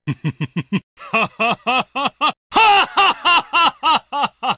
hahahahaha

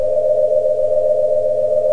cheesy computer

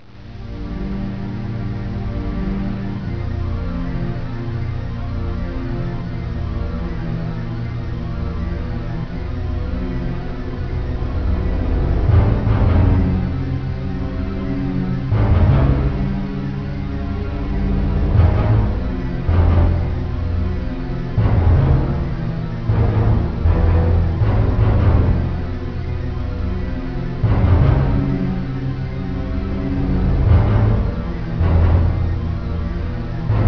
Song

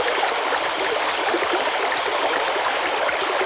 mine water stream loop

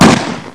shoot 45; kurtz

